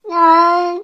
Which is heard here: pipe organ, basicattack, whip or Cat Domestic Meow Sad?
Cat Domestic Meow Sad